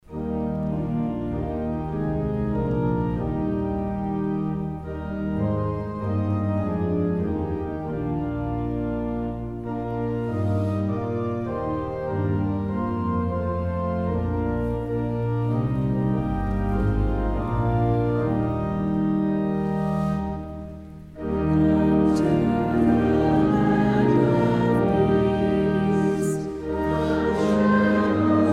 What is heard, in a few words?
hymn